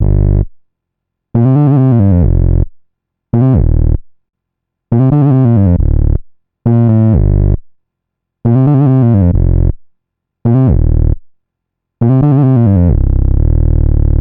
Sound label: Synth loop 135 Gm, Synth Bass Loops; Slate Digital BASSHOLE Sample Pack